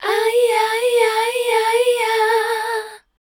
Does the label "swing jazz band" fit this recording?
No